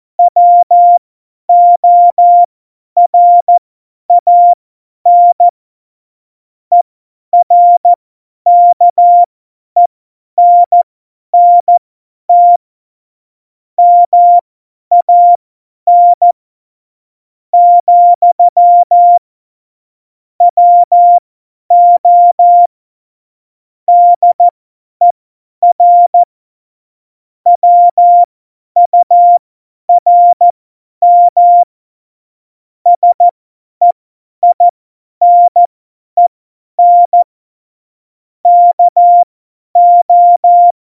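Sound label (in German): Witz, cw morsen; Morsekurs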